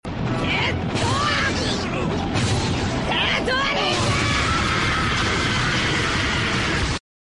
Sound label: jet